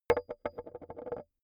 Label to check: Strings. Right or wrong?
Wrong